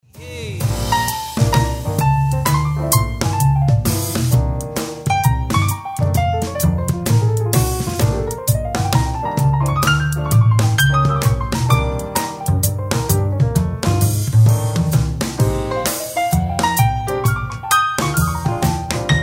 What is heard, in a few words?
jazz